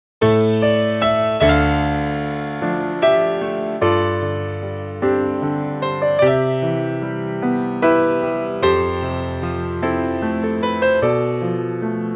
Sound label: Am Piano; key - Am - vocal range - A to A